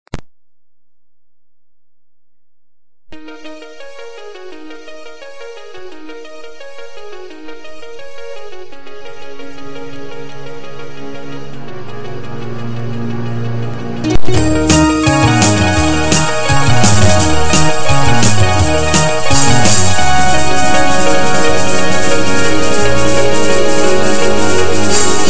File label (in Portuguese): rock chinês